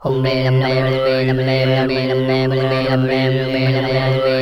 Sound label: MUMBLERS, human syn; Spectrasonics; Vocal Planet; Jazz FX